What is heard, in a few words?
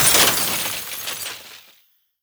Building Particle Falls On Floor